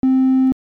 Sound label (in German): dreieck, synthesizer basics